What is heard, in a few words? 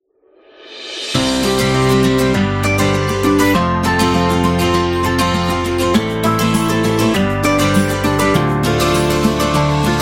Acoustic Guitar; wedding music